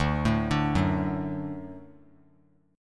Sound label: D Major D down, D Chord; D arpeggiated; JGuitar